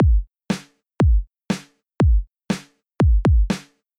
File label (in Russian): rhythm, Ритм 2; чередуя 1-ю и 2-ю ритмовую фигуру бас-бочки – получим усложнённый